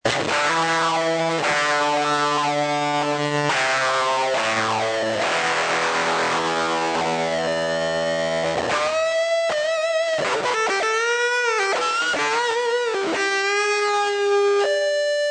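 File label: Uglyface, guitar; Strat - effect - sound card; Control settings ignored.; Tim Escobedo's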